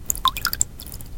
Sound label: water